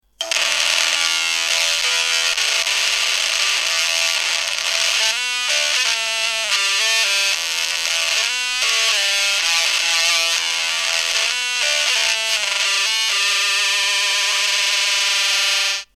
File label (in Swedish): Ringsignal